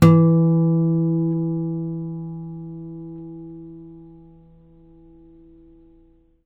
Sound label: STEEL HARM E, GUITARS; Synclavier Sampler Library